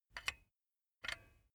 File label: silicone gun bullet lock mechanism soft; berufe; handwerker; soundpool